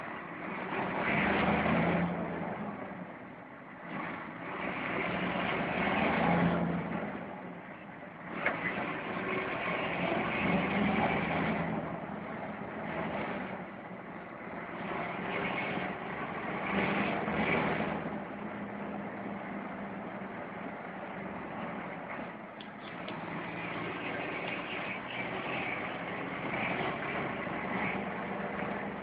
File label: Scania R420